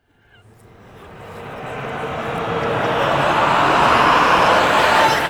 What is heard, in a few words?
LAUGH REV